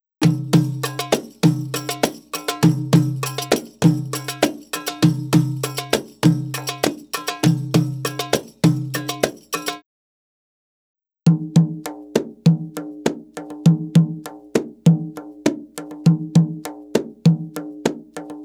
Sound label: Arabic Rhythms